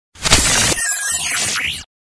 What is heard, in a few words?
Galery Noise; Sound Ideas - Galery Noise 002